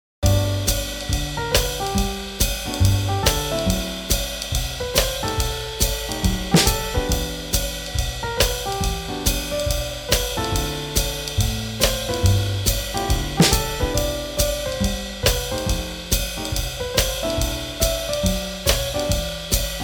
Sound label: Swing Jazz